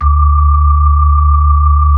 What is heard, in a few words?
B-3, Hollow